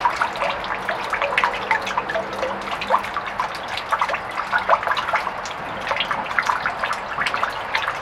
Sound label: GF Water Drippage; gnarled forest